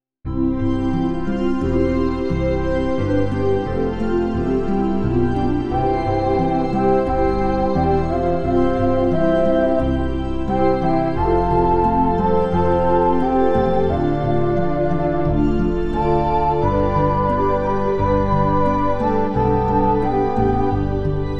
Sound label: Mealtime Prayer instrumental; New Hope Music